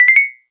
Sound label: ding up